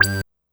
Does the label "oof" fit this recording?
No